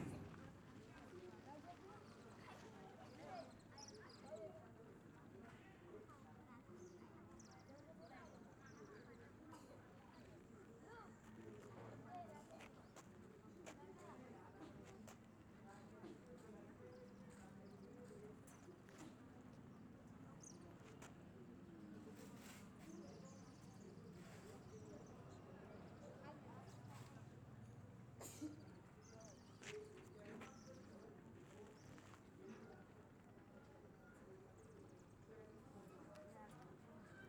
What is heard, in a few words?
African Village Outside School Ambience Daytime Stereo Binaural